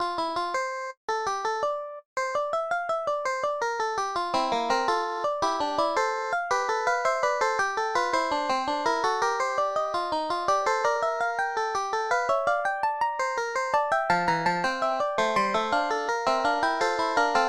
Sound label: in F Major; BWV 880